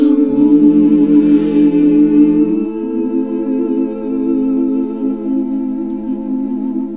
SING, CLASSIC VOX; Best Service; Hallelujah

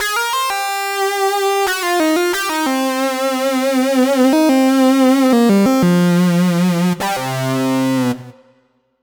Lead Synth Lick; Dr. Fink's Funk Factory

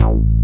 low end bass; Masterbits; Dance Trax

